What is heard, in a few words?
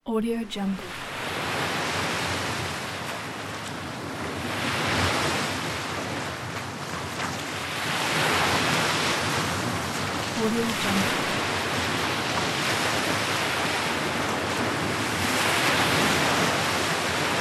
ocean